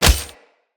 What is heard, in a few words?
Unarmed Tempest Punch Impact Default